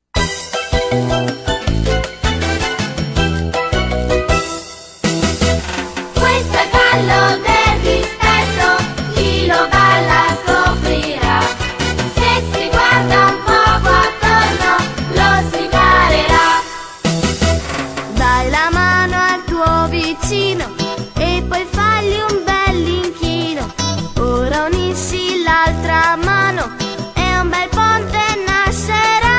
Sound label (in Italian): Canzoni per bambini; Mela Music; la giornata della memoria